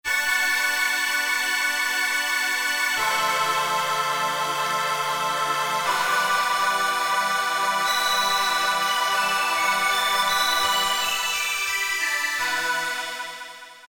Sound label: Glass, Korg MS2000; Factory Patches